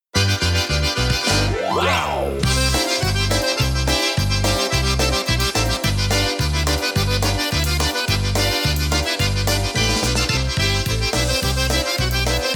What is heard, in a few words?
Wow Polka Inst.